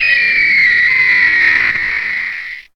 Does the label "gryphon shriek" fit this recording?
Yes